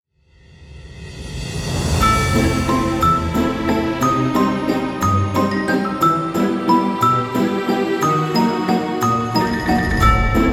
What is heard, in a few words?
music; loginween